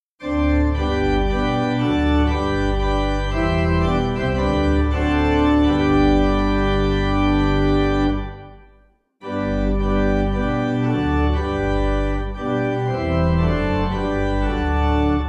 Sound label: organ, hymn; 2vs; lyons; Hymnal 1982